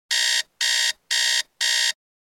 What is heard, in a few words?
Alarm Clock Buzzer